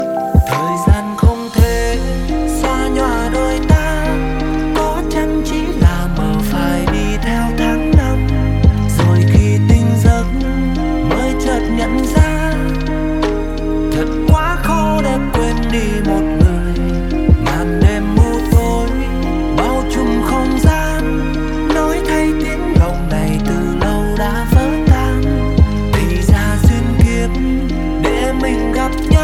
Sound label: lofi version